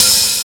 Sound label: open hat one shot e key